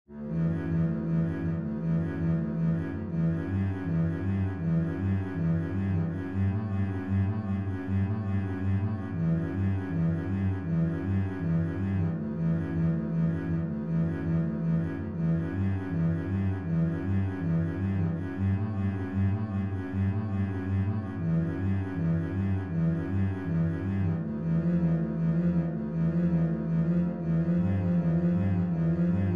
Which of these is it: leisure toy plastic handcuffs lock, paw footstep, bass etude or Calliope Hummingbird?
bass etude